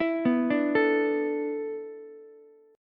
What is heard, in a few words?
A Suspended 2nd Suspended 4th, down, Ukulele; Asus2sus4 arpeggiated; JGuitar